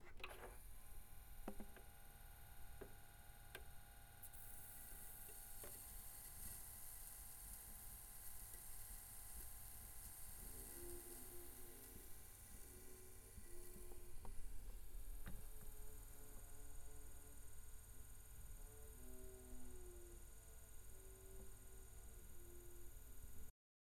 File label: Vinyl Spinning